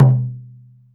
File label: low mid tom drum single hit c key